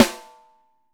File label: 6X12 ALUMNUM, Living Drums